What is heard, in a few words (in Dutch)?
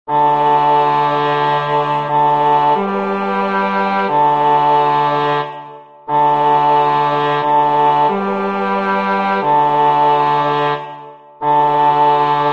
bas; JMBach; Unser Leben; Bach Johann Michael